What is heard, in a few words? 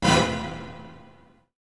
Hit; ZERO G - Datafile DISC 1